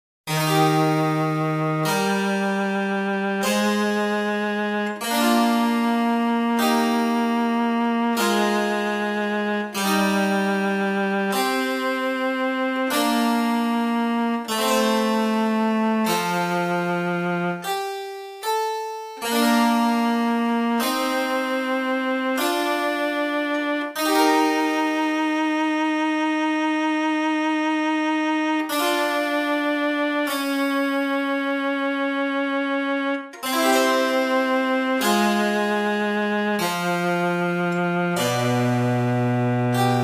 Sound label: oboe sonata; オーボエ用伴奏; handel; hwv366